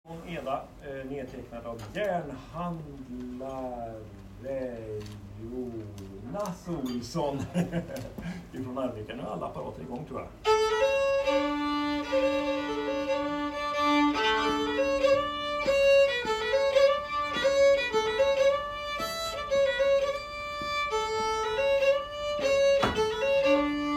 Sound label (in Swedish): Polska